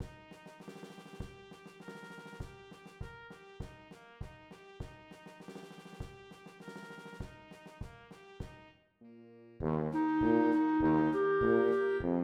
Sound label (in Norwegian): klarinett